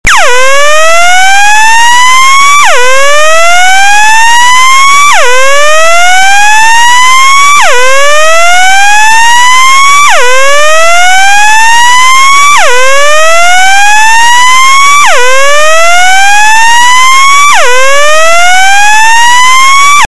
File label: whooper